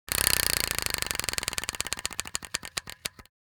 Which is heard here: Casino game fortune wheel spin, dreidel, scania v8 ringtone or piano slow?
Casino game fortune wheel spin